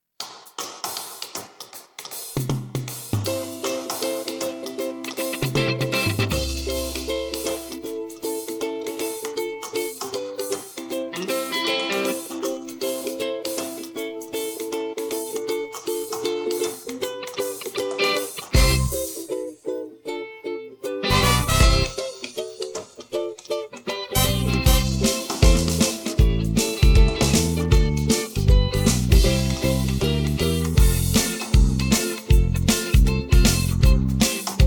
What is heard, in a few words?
instrumental